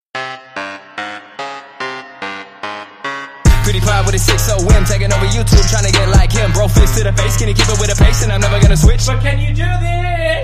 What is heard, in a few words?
outro song; pewdiepie